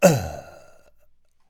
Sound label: Fight Shout Man Hit